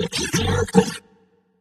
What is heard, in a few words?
synth idle; scmod